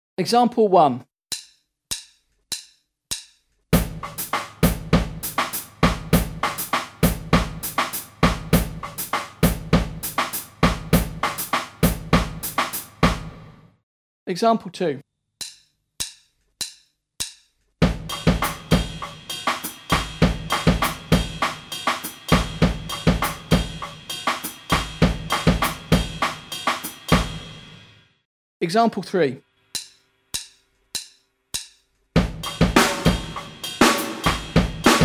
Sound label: Drum set